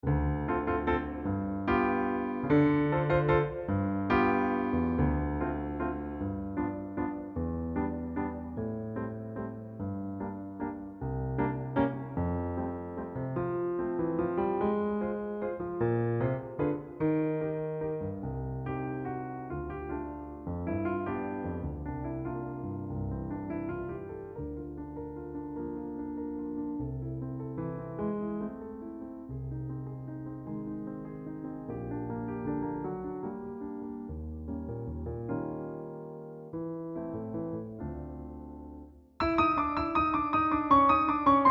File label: accompaniment